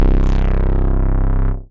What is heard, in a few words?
Basscar, Wavetable, Bass; Instruments; Ableton; Synth Essentials